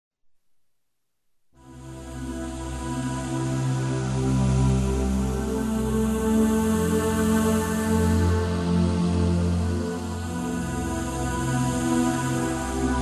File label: Ambient